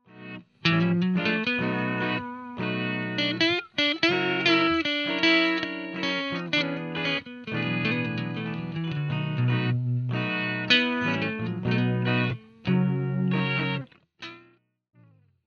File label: blues